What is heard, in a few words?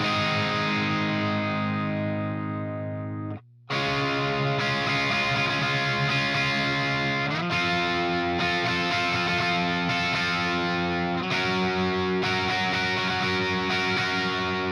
EMG89 CRUNCH, guitar; EMG89レビュー導入